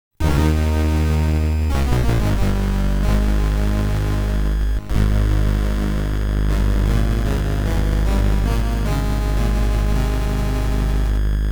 Start BGM (From Toccata and Fugue in D Minor)

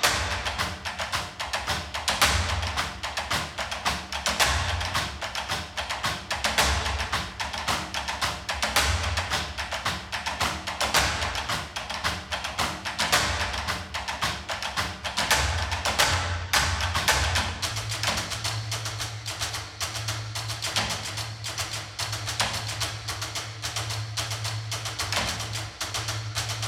Instrumental Drums; nowhere station

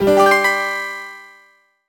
Dance piano phrase; Puchicon; dsi ds

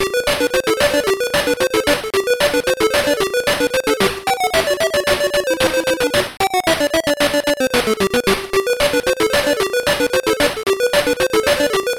In Game Fast; The Castle; SG-1000

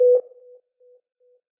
Ambience Interior Hospital

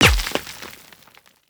Hit Bullet Brick

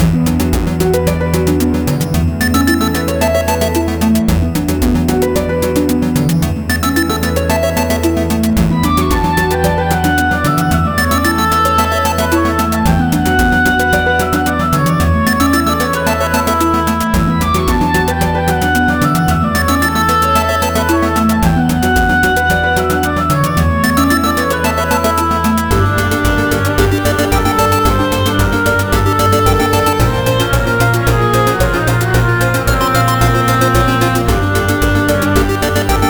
bgm dungeon; 3D Dot Game Heroes

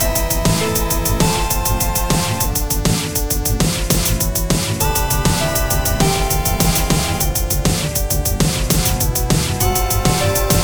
Very Tense

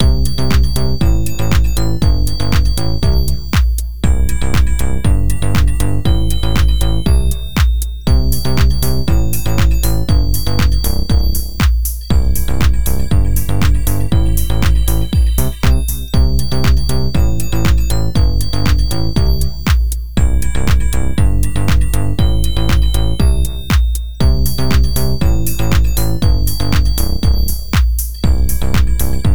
Boss Fanfare; tallowmere gamerip 2014

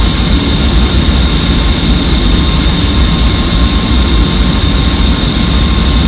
Jetcar Low; Nicktoons Nitro; arcade

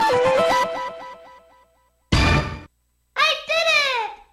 Map Clear; bomberman hero

